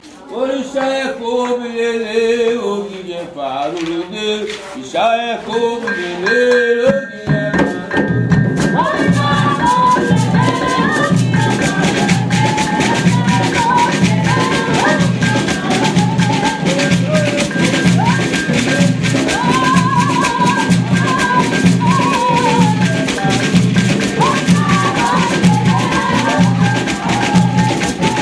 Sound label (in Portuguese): terreiro da turquia trecho